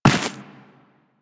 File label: Run Dirt, Footsteps; Enemies; Warframe; Teshin; DE